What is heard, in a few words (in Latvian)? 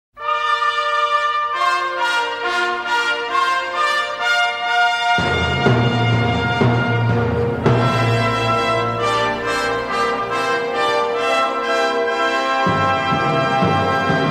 dziesmu svetku fanfaras